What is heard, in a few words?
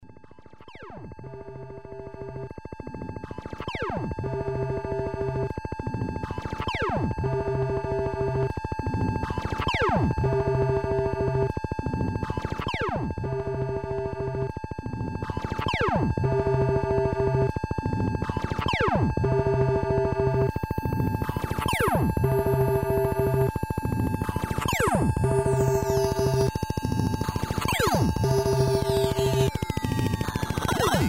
synth noise